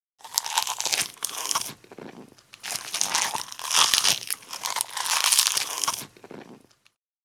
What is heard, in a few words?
Eating vegetables crunchy munching